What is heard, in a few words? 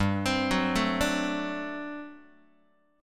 G Major 7th Suspended 2nd Suspended 4th G down Guitar; GM7sus2sus4 arpeggiated; x,3,3,4,1,1